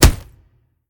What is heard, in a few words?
hit minion; smite; gilgamesh